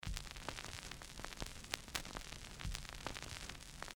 vinyl crackling

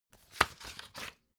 folder page turning

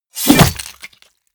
flesh axe two-handed hit

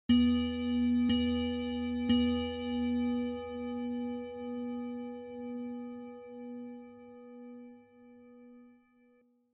clock strike

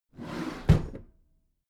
packed drawer smooth close heavy

